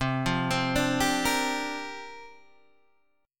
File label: C 7th Suspended 2nd Suspended 4th, Guitar, Open D